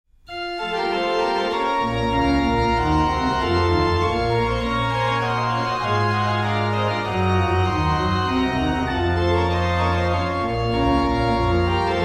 Bach Organ Works; Wer nur den lieben Gott lt walten; BWV 642; Orgelbchlein

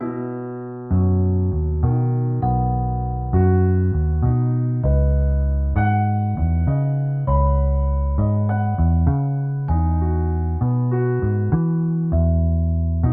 Backwards Beat; Thoughtful

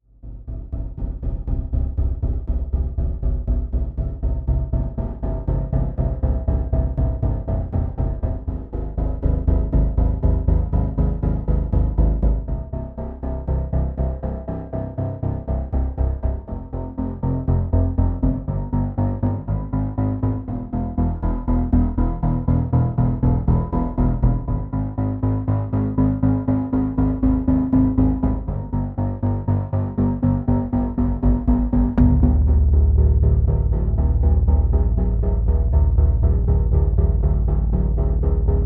Dark Building Tension Deep Minimal Film Trailer; CUES for film TV games etc

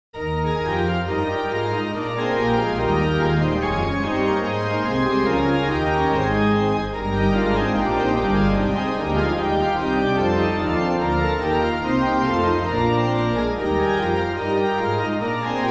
Bach Organ Works; BWV 638; Es ist das Heil uns kommen her; Orgelbchlein

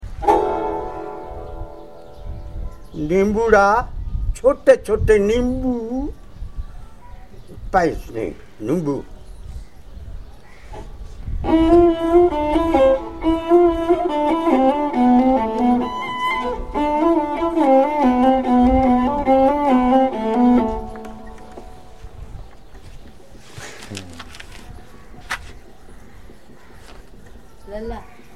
lake Kamayacha, Field Recordings from India; WFMU

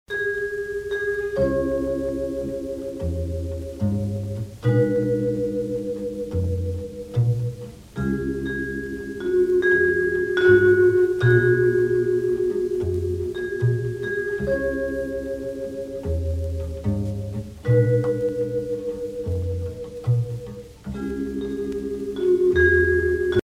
vibraphone; ShortDocs 2010 sonic doodads

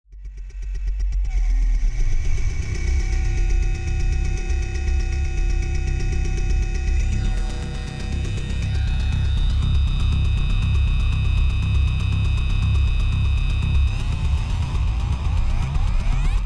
Industrial mid-pace epic - 120bpm; CUES for film TV games etc

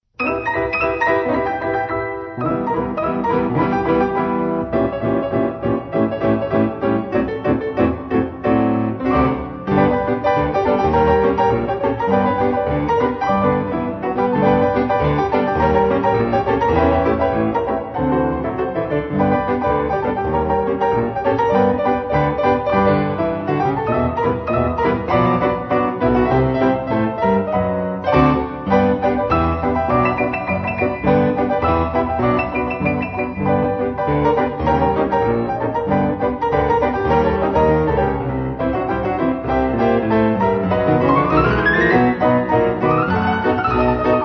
piano roll; 1916

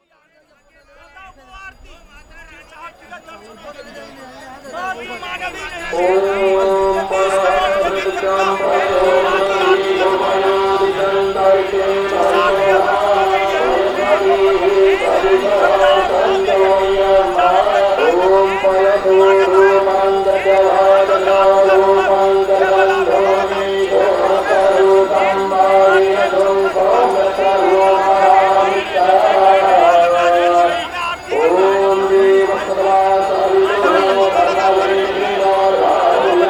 Ganga Aarti Ceremony Haridwar, Religious Music; Samuel Corwin; Selected Field Recordings from India and Nepal Volume II Religious Music

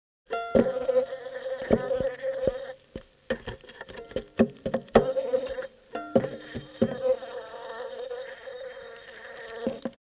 Fly Trapped In A Violin

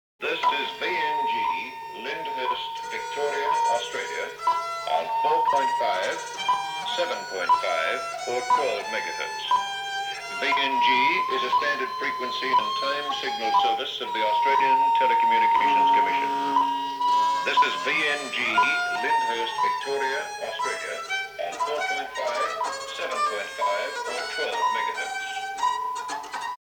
Violin With Built In FM Radio Broadcast

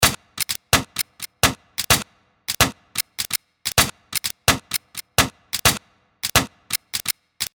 127 crispy; Loop Mania Sampler Pack

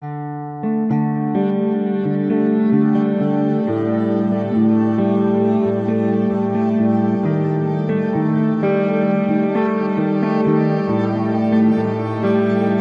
Spacey Dreamy Chill Slightly Dark no bass; CUES for film TV games etc CHILL

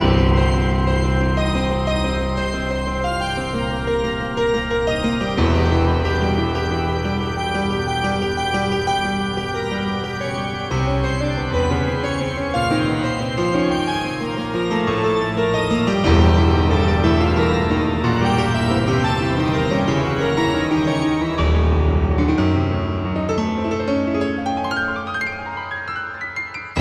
Dark Demented Twisted Piano Organ; CUES for film TV games etc

